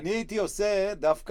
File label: Bass Bot